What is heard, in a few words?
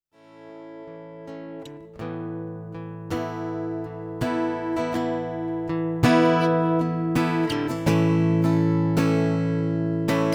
Shining Guitar Mix; Film and TV music volume 2 TRADITIONAL MOODS